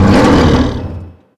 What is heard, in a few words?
agrunt door; househead nexbot